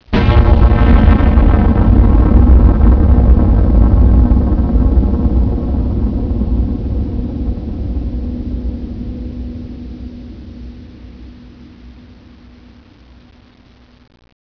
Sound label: Space Blast; WARLOCKSNDS